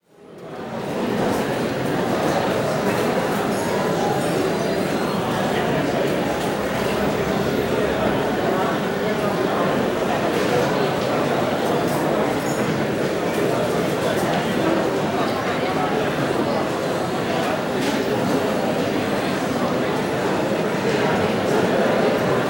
Restaurant Large Busy Crowd; crowd walla; Sound Ideas; Walla Series

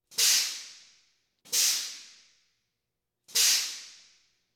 Police, Fire engines

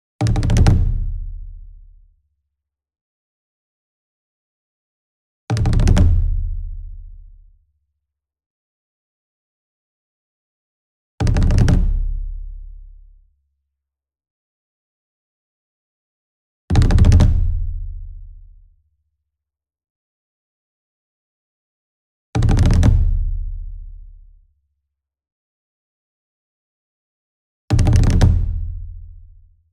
ENSEMBLE DRUM Roll Near; boom library; Cinematic Strikes Construction Kit

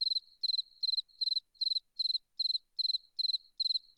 cricket

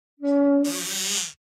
Door - Wood Rub Creak Medium; Hollywood Edge; sound designer's toolkit v2

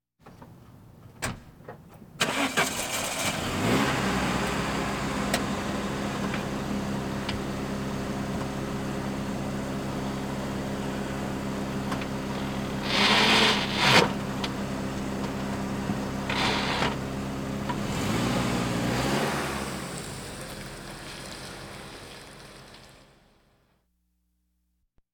Automobiles various

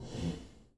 Foosball Goalie Slide

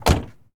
Z28 Door Close; Automobiles

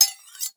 Sword Swipe; Weapons; 1001 Sound Effects

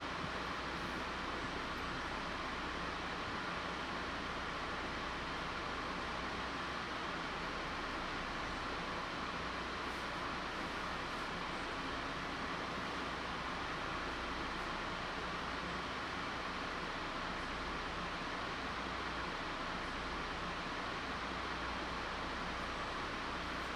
AMBISONIC INT Passenger Train in Station Empty; Metro North

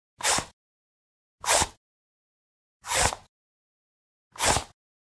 Generic Slide; boom library; The Interface

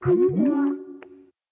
BUSY; skype

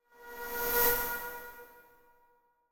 Static Buzz By Thin Buzz, Creepy Pass; Hollywood Edge; sound designer's toolkit v2

